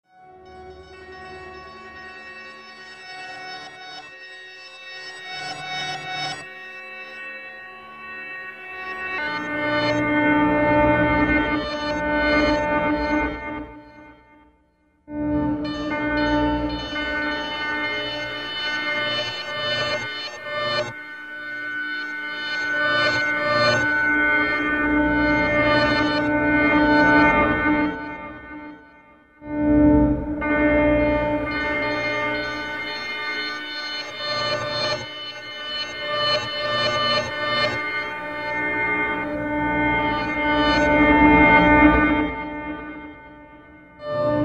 piano fx ambient

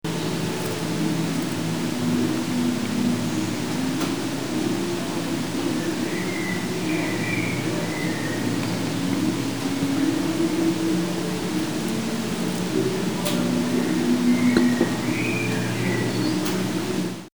Field Recording Original; M4L Bulbs; Rene Margraff

